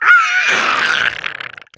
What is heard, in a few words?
Raptor Hatchling